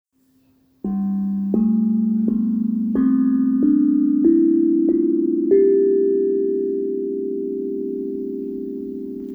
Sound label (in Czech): černý nataraj stupnice, kovový buben nataraj velký c dur